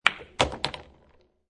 Billiard Pool Snooker Ball Hits Other Ball Which Falls In Pocket; Pool Sound Effects